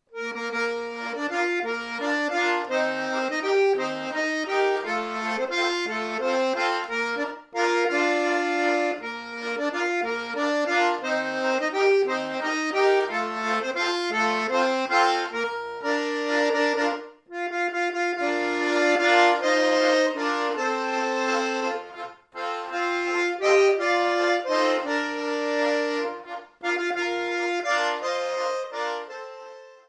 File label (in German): jodler, Schwyzerörgeli; oergelinoten